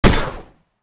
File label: repeater rifle fire